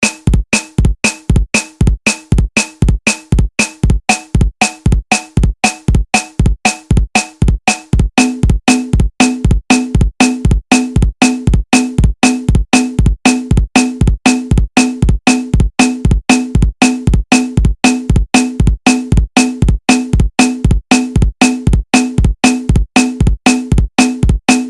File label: Simple rhythm